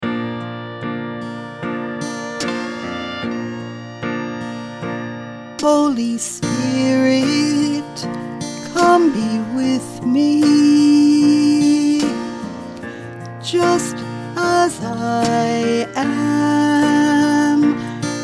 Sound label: Holy Spirit come be with us Bflat VOCAL; pentecost